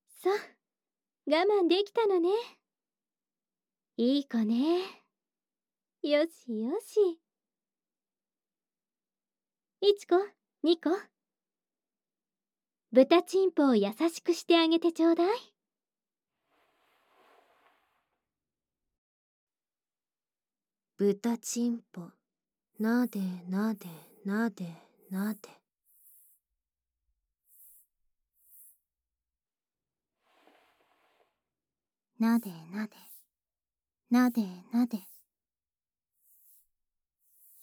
キンタマ責め＆メイドさんの耳舐め責め; 百合子様

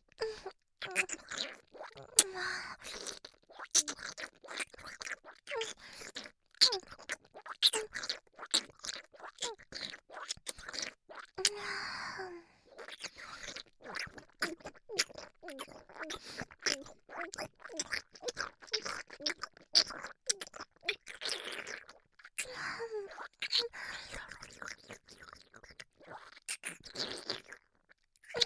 フェラ音; カレン

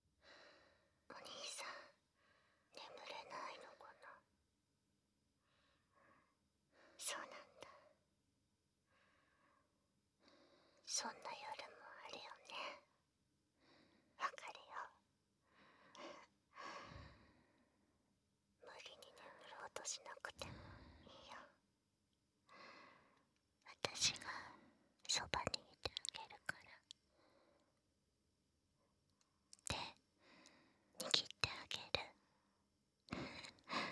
お狐ちゃんの添い寝 寝息入り, BGMなし

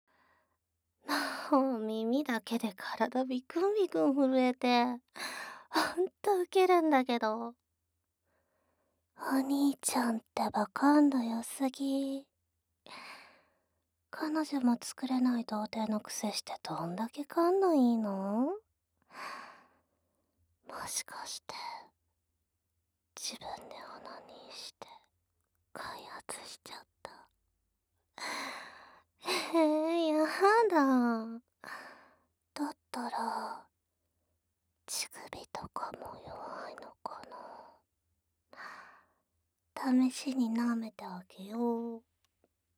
乳首舐め

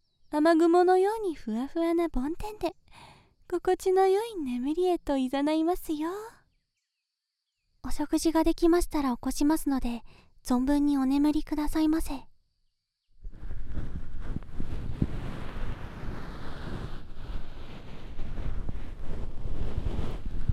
梵天 で 耳かき

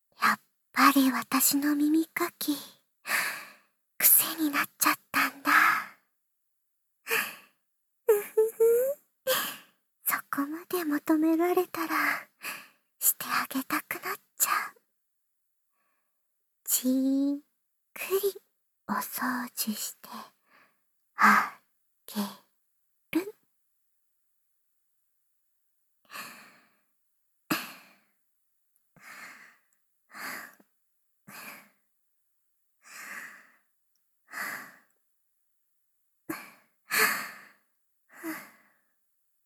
吐息耳かき（バイノーラル編集 効果音なし）

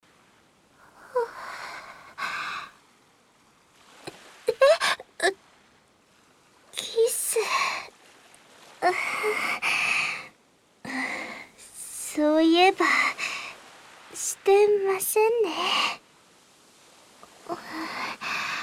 キス, ASMR; 自ら挿入

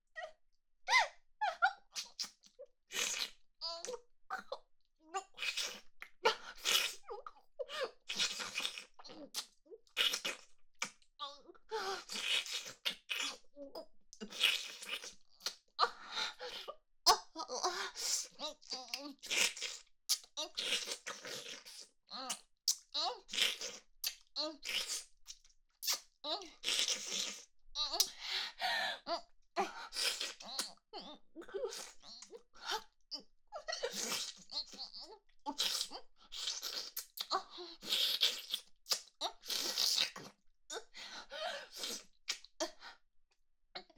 イラマチオ・恐怖に泣きながら口に咥えている声; 明るい少女系カノジョ

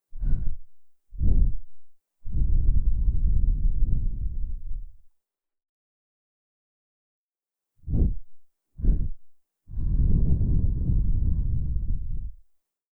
耳かき, 吐息

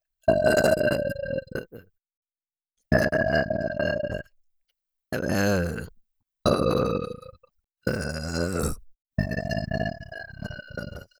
ゲップのみ; ご奉仕前のごあいさつ

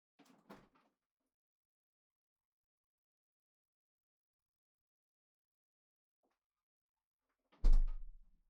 冷蔵庫開閉; KU100; 食事に関する音

